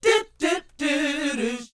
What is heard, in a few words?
SHOO B E, R&B Dance, vocal; Spectrasonics Vocal Planet